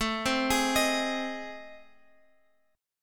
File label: A Minor Major 7th Flat 5th, Guitar Open F; AmM7b5; arpeggiated; 21,19,20,22,x,x